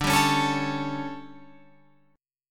D Major 7th Suspended 4th, Guitar, Open F; DM7sus4; strummed; 14,14,13,16,16,16

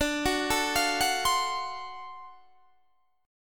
D 7th Sharp 9th D down Guitar Standard; arpeggiated; JGuitar

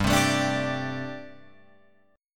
G Suspended 2nd Suspended 4th G, Guitar, Open F; Gsus2sus4 strummed; JGuitar

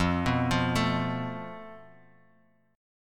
F Major Flat 5th, Guitar, Half Step Down

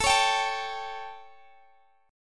G Suspended 2nd Flat 5th A, chord

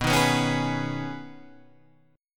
B 6th Add 9th B Guitar Standard, chord; 7,6,9,6,9,7; strummed; capo=6

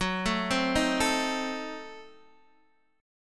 F sharp Diminished 7th F sharp Guitar Open D

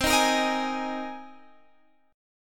C Minor 7th Sharp 5th C

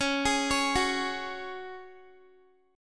Db Suspended 4th Db, Guitar; arpeggiated; x,16,18,18,18,x

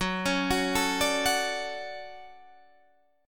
D-Major 7th Gb

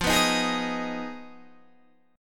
F Minor 7th Flat 5th F down Guitar Open G

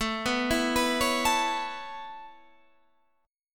C sharp Minor 7th Sharp 5th A

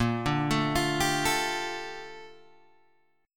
A sharp Major 13th, Guitar Open G

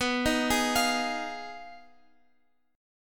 B 6th Flat 5th B down Guitar Open F; arpeggiated

